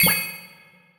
Perc; pbs - supersmashbros

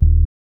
kick, Kicks

